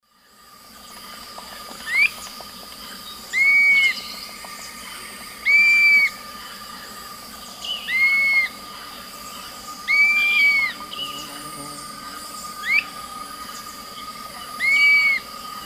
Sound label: Myiarchus tuberculifer, dawn song; S. America; Eastern Lowlands